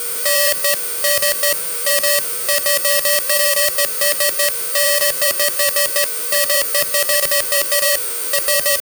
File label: data counter digital; digital effects